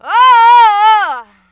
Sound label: anger